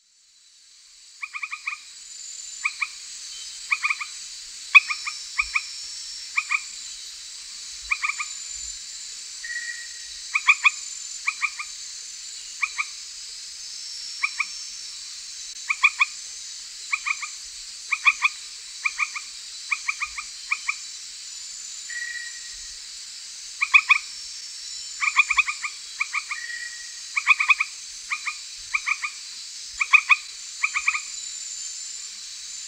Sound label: Hyla granosa